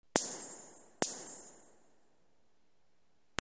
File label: Glass Break